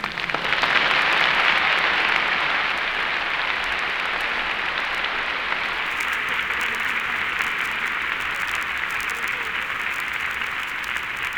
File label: applause; as Lionel Hampton Enters